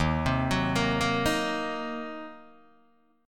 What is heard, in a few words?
Eb Minor 6th Eb down Guitar DADGAD; arpeggiated